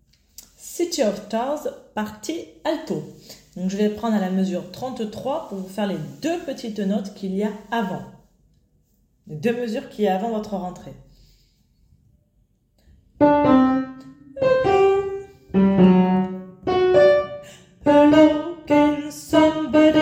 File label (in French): alto